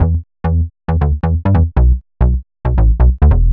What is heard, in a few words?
Stat Bass E, uk garage, 136bpm, Bass; Lines n Loops